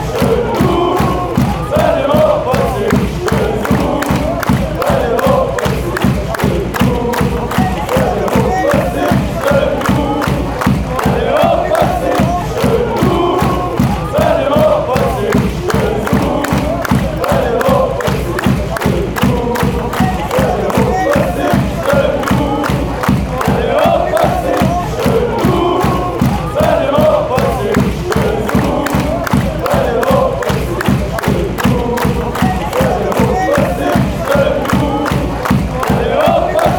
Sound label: chant, fanchants; grasshoppers